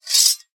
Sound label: warfare swords scrape together